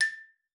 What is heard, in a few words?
A5, xylophone; Xsample VOL-4